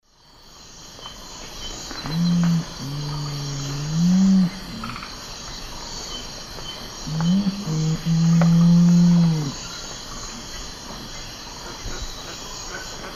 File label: Nothocrax urumutum, song; S. America; Eastern Lowlands